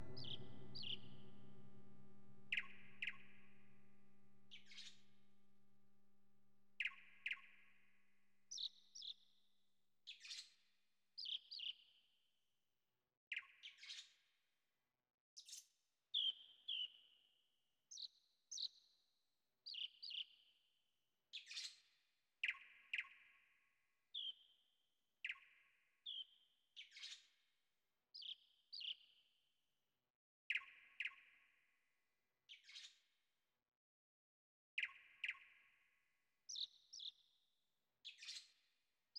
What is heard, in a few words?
Wildlife Ambience; Pokemon Snap